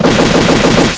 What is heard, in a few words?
thinking; evil manfred